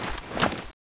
chain sr draw, weapons

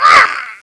zombie hurt; booster zombie

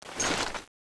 plasma draw, weapons

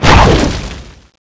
whip sword slash loop

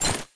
mine shoot, weapons

